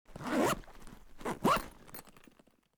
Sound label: bag zipper, cloth